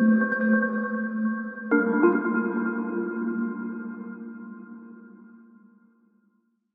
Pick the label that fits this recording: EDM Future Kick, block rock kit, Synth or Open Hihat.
Synth